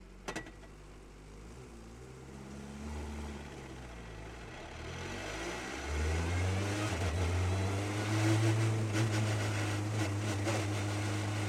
Citroen Xsara WRC ONBRD MEDIUM Drive Various ENGINE Intake; 2001; DPA4062; Pole Position